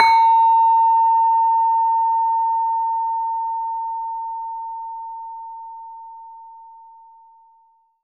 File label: LAMEL A4 -L; USB Soundscan vol.60; AKAI; LAMELOFO